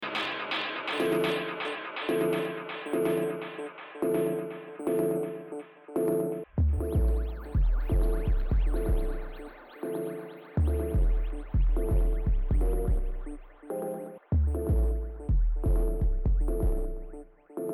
SYNTH BASS DISTORTION; API

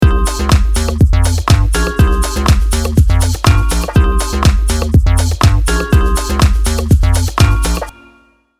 4 Bars Phrygian

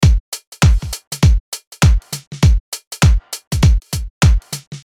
Drums Full

